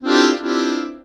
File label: Cartoon Accordion Squeeze; SFX Kit; Foley - Misc